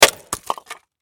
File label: Impact Axe Chop Log; Axe Sound Effects; Axes (Sound Effects:Tools); SFX Bible 6